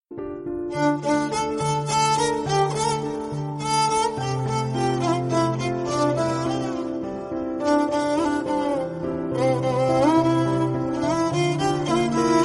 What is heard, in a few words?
heart touching violin ringtone; malayalam ringtones